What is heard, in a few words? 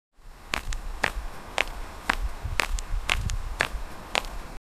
walking sound in the alle, alley